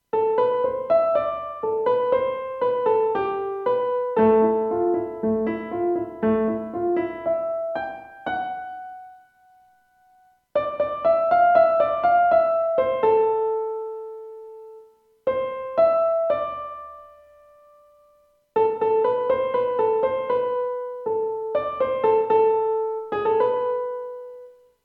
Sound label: ancient castle melo